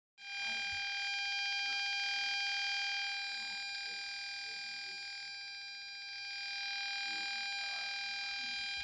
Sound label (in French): parasite, casque audio